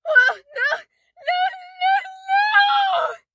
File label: town human far; Blind Drive